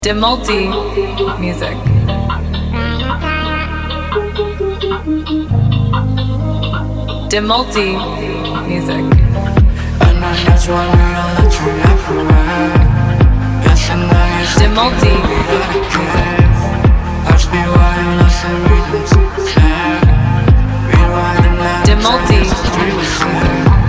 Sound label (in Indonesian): edm, menghadirkan nuansa EDM modern yang asik dengan vocal chop high